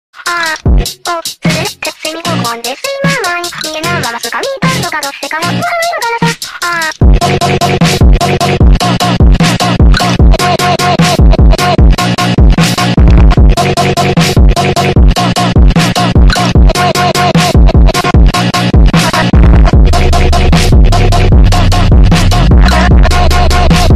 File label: phonk ringtone